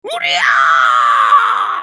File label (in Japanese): 攻撃長; ジャック